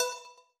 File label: Win Count Up End; netent; eldorado